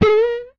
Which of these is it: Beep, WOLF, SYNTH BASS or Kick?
Beep